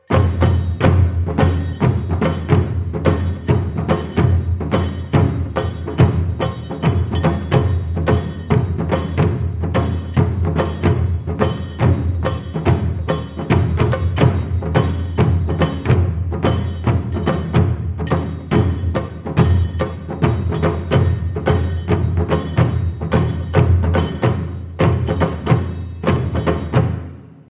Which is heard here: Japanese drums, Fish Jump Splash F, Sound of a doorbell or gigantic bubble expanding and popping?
Japanese drums